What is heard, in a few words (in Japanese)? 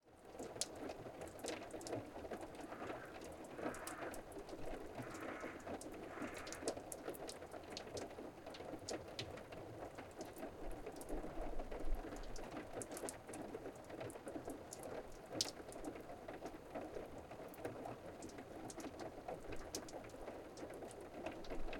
屋根から溶けた雪の水音